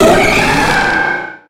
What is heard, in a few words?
pokemon cry